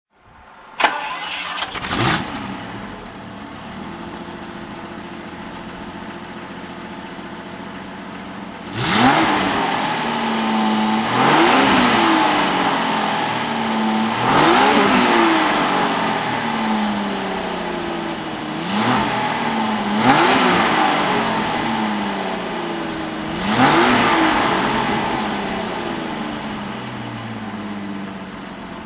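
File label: daimler double six throttle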